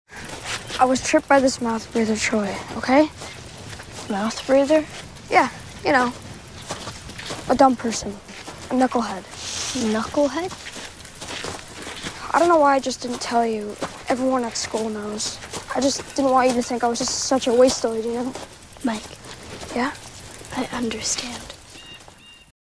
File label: Mouthbreather